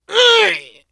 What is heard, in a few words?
hurt critical